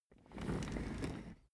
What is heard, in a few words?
office chair trundle slow short